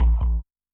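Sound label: jungle bass; Yamaha DTXpress-II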